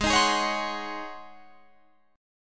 Ab 11th Ab Guitar Drop D; 18,18,18,18,19,20; Ab11 strummed

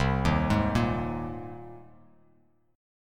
C Minor 7th Sharp 5th C Bass Standard; Cm7#5 arpeggiated; JGuitar

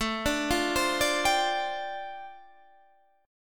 G 7th Suspended 2nd Suspended 4th A

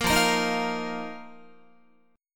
F 7th Suspended 4th F down Guitar; strummed

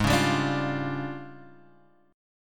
D Minor Major 7th Double Flat 5th, G sharp

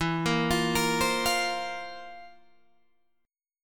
C 11th E

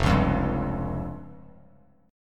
F Suspended 2nd Sharp 5th, Bass Standard; Fsus2#5 strummed; JGuitar

